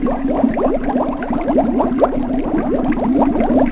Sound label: Ambient Tank; Finding Nemo; XBOX